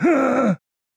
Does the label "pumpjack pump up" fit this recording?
No